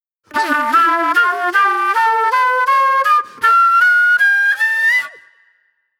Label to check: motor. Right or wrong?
Wrong